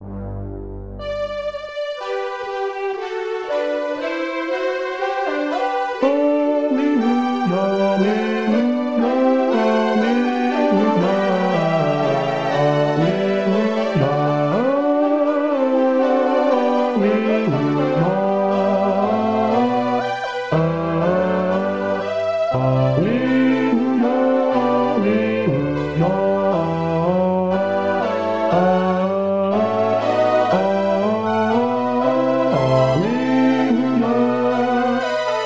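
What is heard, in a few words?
Alleluja Bass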